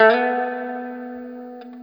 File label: Guitar & Bass; Quantum Leap; 90's Sample CDs